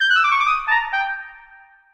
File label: Whisper